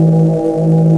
throb, ships engine; Quantum Redshift; XBOX; marcus

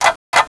clock; WhiteOut; XBOX

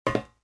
metal step, footsteps

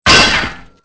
cable; Soldier Of Fortune II Double Helix; XBOX

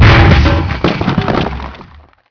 Wall breech

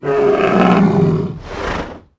Call, Dino; Toro

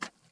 fs dirt, footsteps

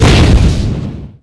Small Exp, Explosions

